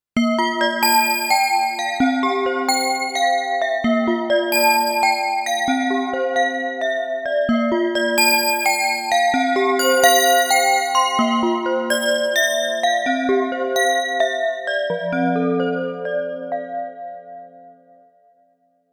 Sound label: nord lead a1 fm bells